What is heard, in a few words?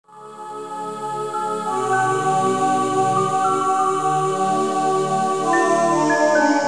XP Shutdown; SAGIDOON XP 2011